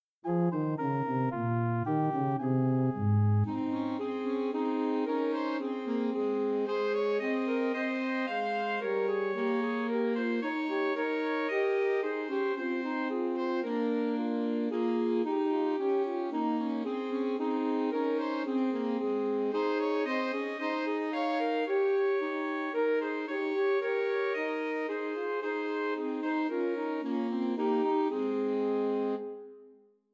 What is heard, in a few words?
Trios for Three Alto Saxophones; Away in a Manger; Christmas